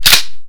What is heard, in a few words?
m3s90 pump back, weapons shotgun; garrysmod